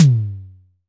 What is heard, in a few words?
long room reverb tom g key, Loudest frequency: 1107Hz; tom drum samples